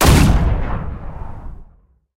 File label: aa fire big; guns; War Thunder